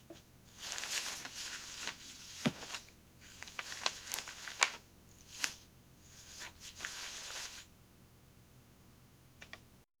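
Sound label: picking up and moving fabric, cloth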